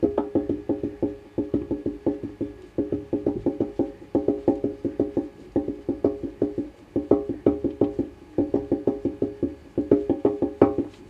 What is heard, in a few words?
Custom Congo